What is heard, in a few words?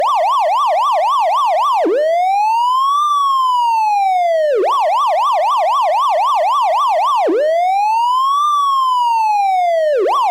patrol car